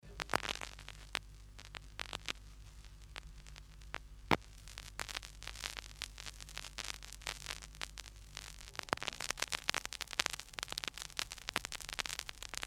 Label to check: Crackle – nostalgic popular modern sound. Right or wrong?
Right